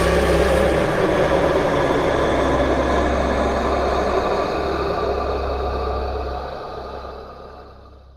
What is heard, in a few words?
scare; scp-173